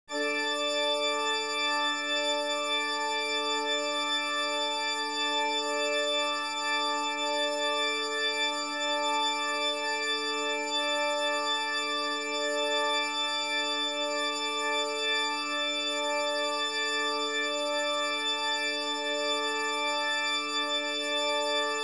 D Drone

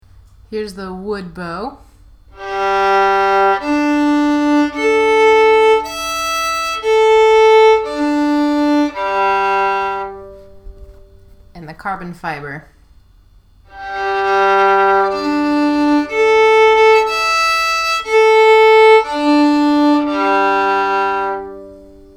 ipe wood vs carbon fiber open strings

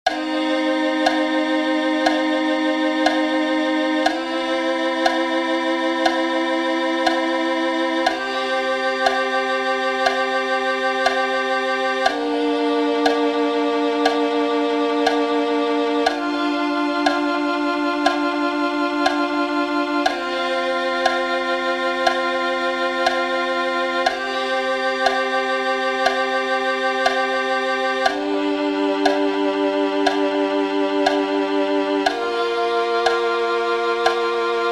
backing track 60bpm

